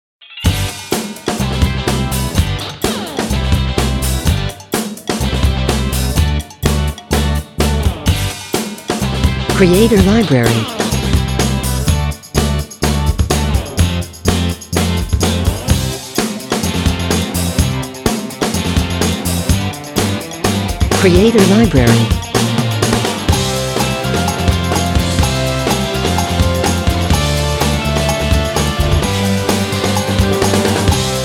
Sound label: Upbeat and Happy, Rock